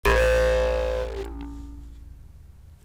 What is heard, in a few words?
Broken Drive, Electronic; SFX